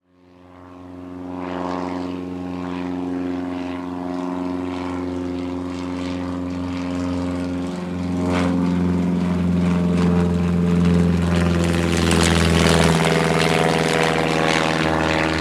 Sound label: biplane fly by; transportation